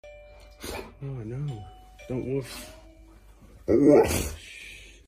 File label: Woof